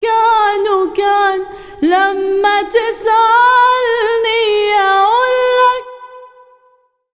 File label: SINGER, Arabian Traditions; USB Soundscan vol.43; AKAI